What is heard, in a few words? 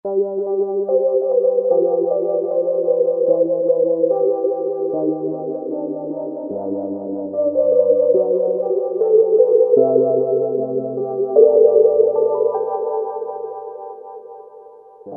Bread Rhodes; '60s & '70s; Roland SR-JV80-08